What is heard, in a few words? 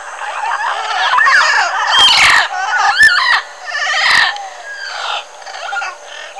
squeals